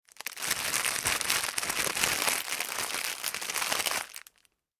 Plastic Packaging Movement

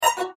Beep Buzz Descend; Production Element Imaging Element Accent Transition; Blastwave

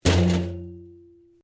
Pipe Tin Move; Material components to create more complex sounds; Materials; Metal

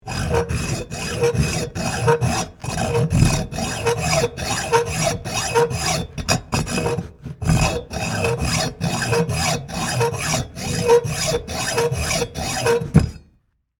Industry, Hack, Saw, Into, Anodized, Steel, Pipe; Saws; BlastWave

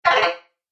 Message Alert, beep

